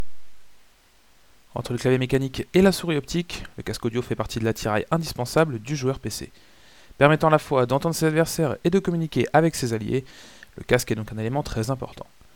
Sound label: soundtest fnatic react plus